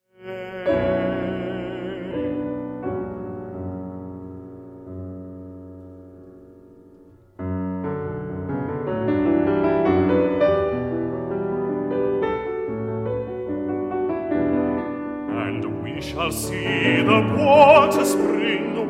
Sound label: for baritone and piano; gerald finzi; earth and air and rain; op 15 no 1 summer schemes